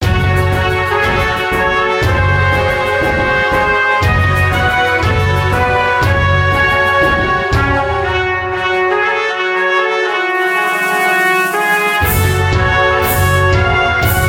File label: free spin melody; Lighting Hot